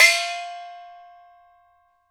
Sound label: XIAORUO, PERCS-KIT; INIS - Opium